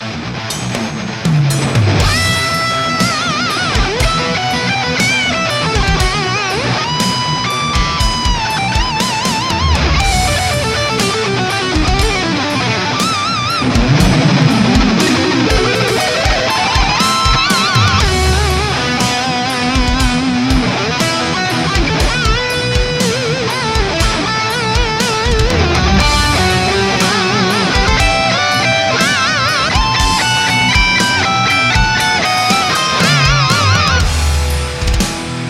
Solo

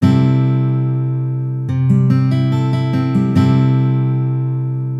C Major Add 6, Guitar Chord Shapes

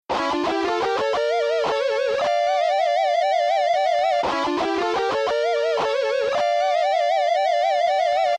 Harmony

